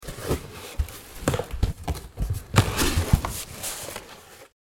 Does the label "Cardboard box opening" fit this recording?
Yes